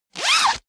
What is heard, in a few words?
zipper pullover jacket open fast; objekte; reissverschluss